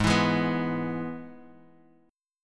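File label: Ab Minor Sharp 5th chord, Guitar, Drop D tuning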